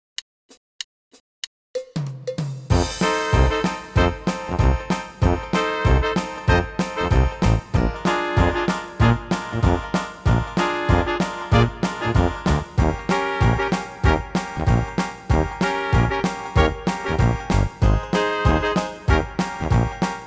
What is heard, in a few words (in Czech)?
doprovod alt saxofon nebo klarinet